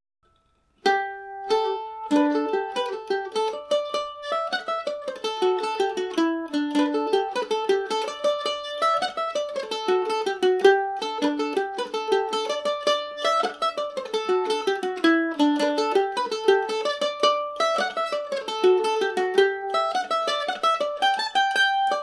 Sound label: dabchick, mandolin; G&O #34 mandolin